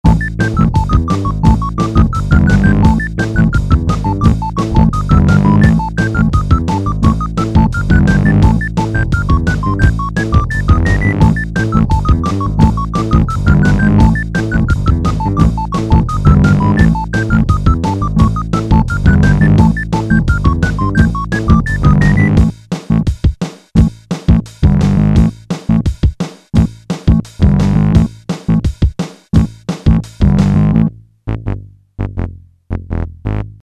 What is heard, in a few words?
disco